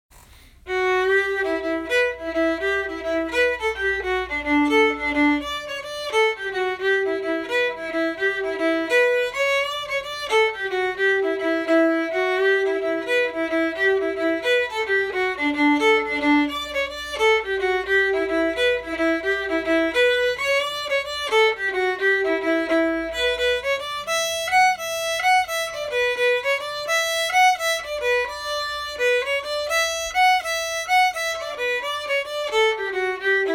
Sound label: swallowtail jig, fiddle